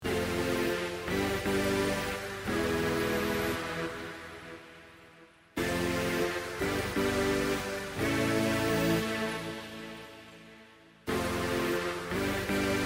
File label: synth layered; Hip Hop /R&B instrumental